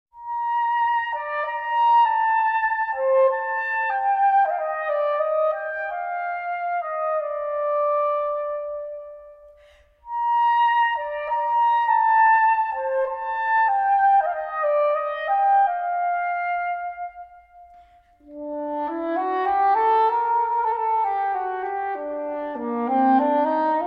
melody for saxophone

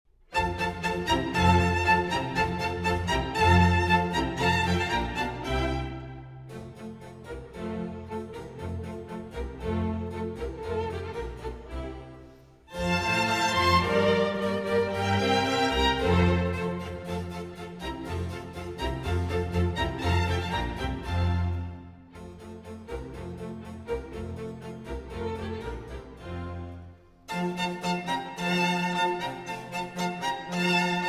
Violin Concerto in F Major; Vivaldi; The Four Seasons; Autumn; I. Allegro

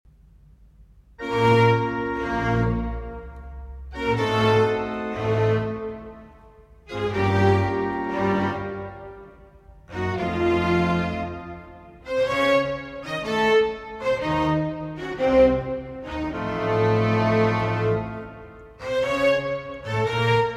Concerto in D Major For 4 Violins

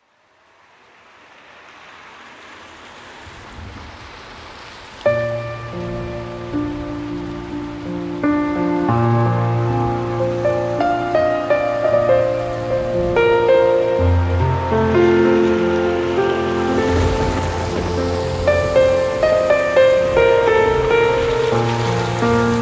Piano and Rain

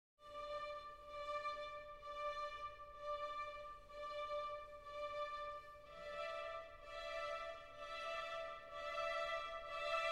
Oboe Concerto in D Minor, Adagio

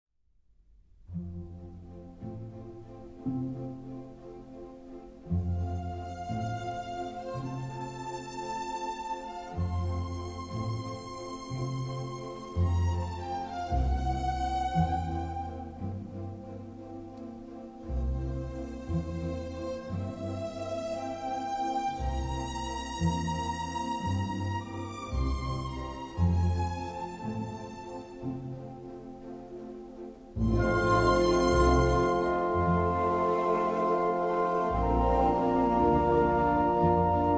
Piano Concerto No. 21 in C Major, Andante

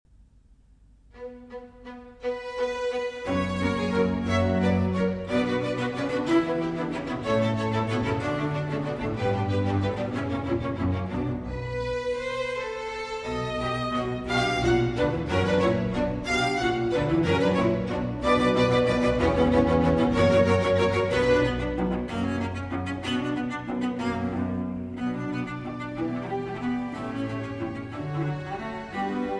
Cello Concerto in C Minor, Allegro; Vivaldi; RV401

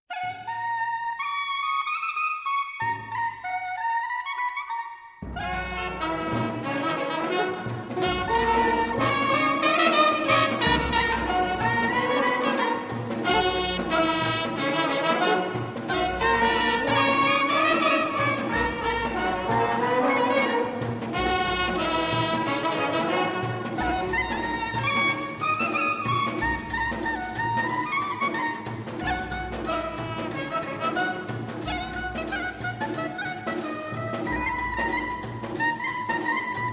Brass-Band

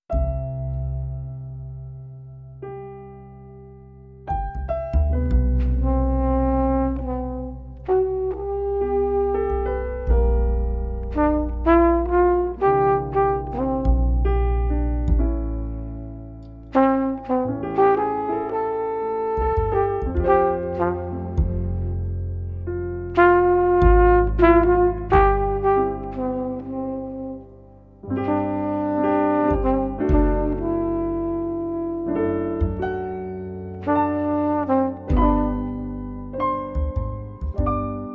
Jazz Lounge

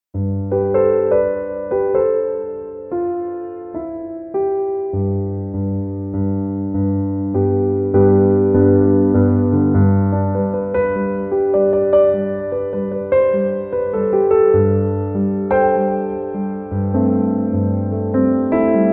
Piano Instrumental

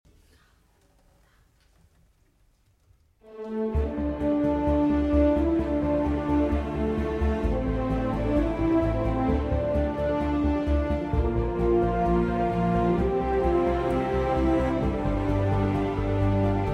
Instrumental

